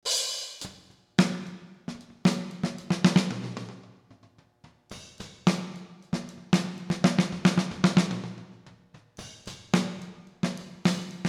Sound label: increasing predelay; audio examples from the book